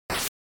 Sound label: NES General Noise Phrase